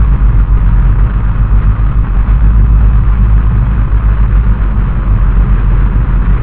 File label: accel small; Allegiance